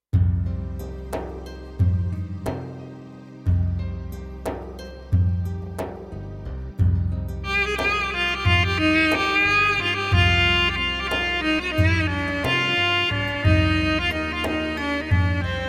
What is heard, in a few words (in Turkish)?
korg pa500 yemen türküsü